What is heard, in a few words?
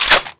chain sr reload, weapons